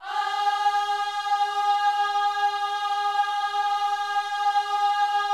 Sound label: OHS G#4, GOSPEL; Spectrasonics; Vocal Planet